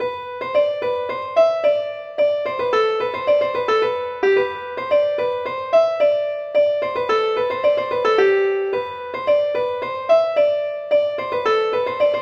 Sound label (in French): suite polkas